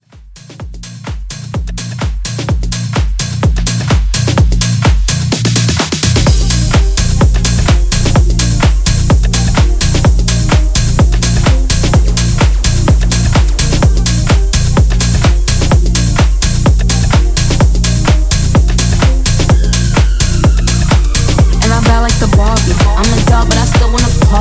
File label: tech house; Remixfreakz